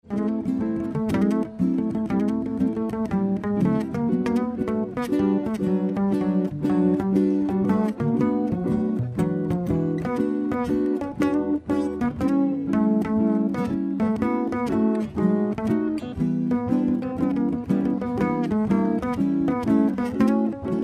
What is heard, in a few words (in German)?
jazz quarett hoerprobe, blues jazz band